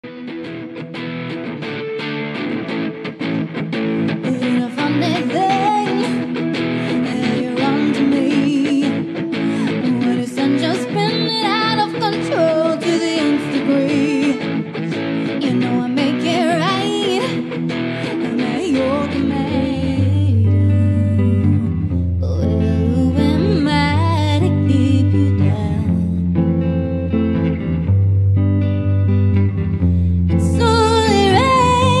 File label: Live Duo Set Medley